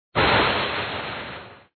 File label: lightning